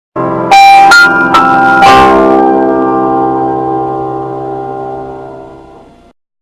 bass boosted; the more you know

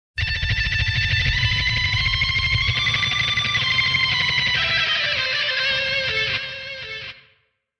guitar theme; totally spies; sebastians

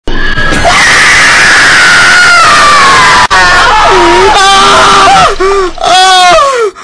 scream loud

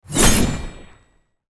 slash sword

